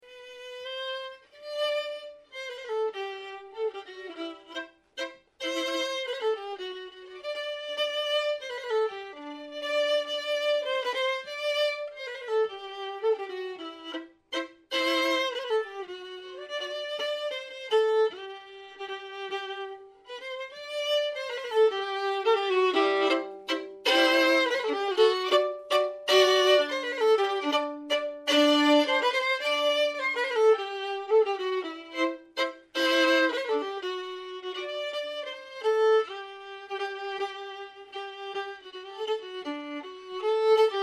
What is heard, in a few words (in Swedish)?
Vals; Ragnar på Slottet Ragnar Andersson Falerum